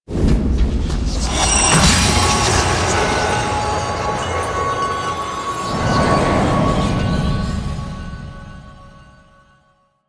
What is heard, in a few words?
Player Capture; Warframe; The New War; Deacons